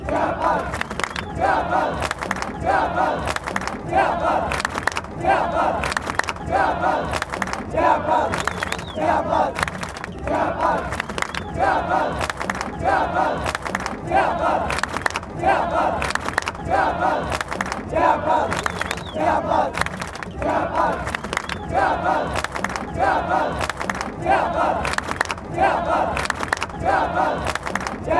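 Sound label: chiapas chiapas, football chants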